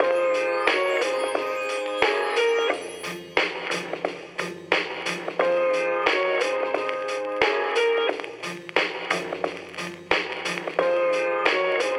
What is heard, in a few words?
Music Underground Loop